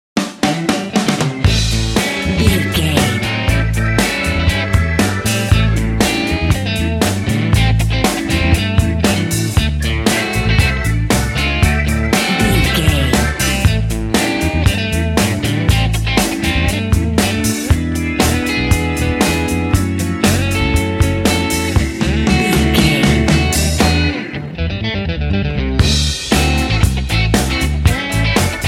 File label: major blues happiness